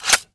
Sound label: mag out, weapons; Valorant; spectre